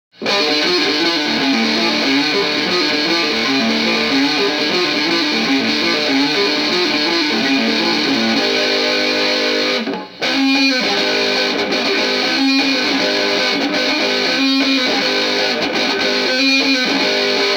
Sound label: guitar